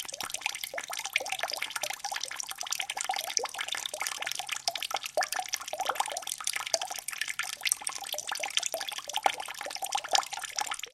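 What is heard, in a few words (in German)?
wasser tröpfelt schnell aus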